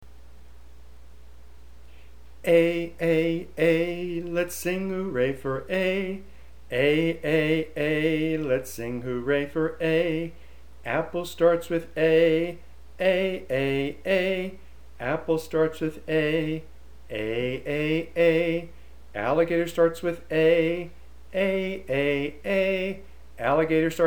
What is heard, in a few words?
Sing hooray for Aa